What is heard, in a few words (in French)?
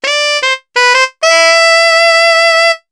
saxo